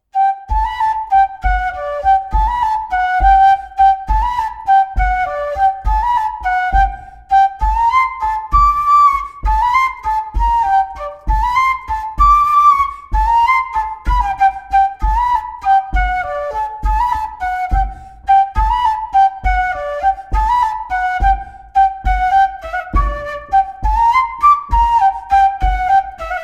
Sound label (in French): Bourree d Auvergne; Meth Josset